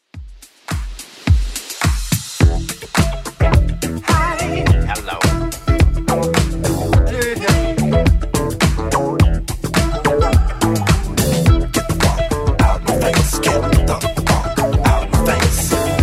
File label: 80s Club Edit 106bpm